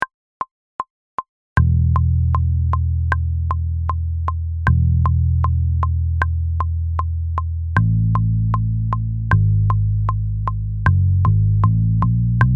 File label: EBass